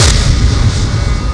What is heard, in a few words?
EXPLOS; Mars